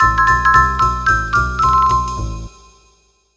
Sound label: Hurry; Haunted2